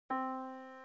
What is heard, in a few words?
Yamaha Disk, piano sound